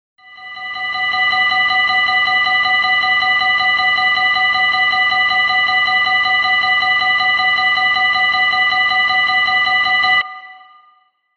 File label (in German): NL Bahnübergang